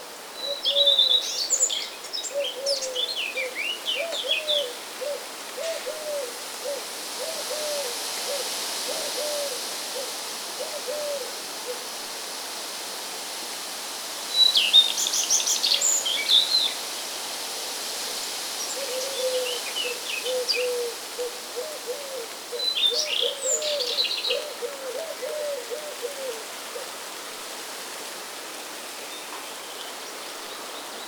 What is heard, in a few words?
sound rain and birds